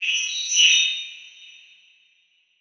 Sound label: Transition Metallic Swell; Production Element; Title Transition; AdobeCC